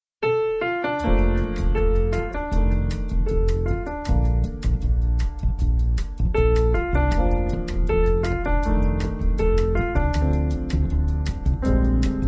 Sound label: Bossa; Caracas